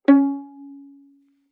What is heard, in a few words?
Violin pizz ff sul G Db4; Strings